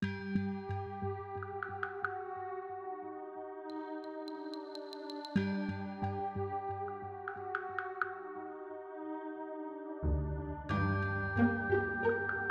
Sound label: Night Battle Prelude; Zombie Rollerz; Pinball Heroes